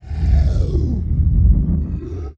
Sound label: Guttural Snarl, Vocals Monster; Sony Pictures Sound Effects Series